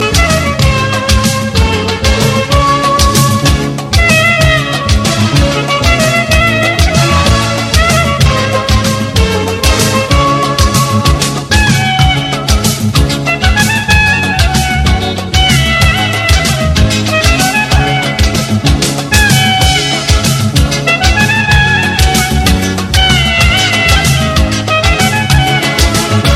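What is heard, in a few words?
clarinet Turkmenistan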